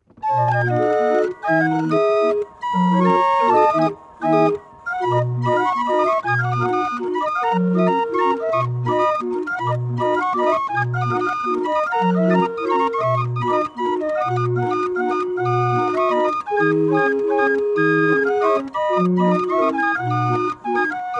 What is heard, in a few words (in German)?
ORGEL